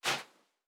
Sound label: Footstep Sand Walking; Character Sounds